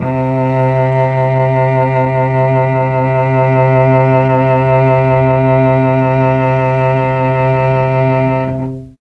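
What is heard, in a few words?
C2 300 CELLO, mellotron, STRINGS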